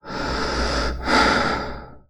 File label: breath; mod xj18; dknight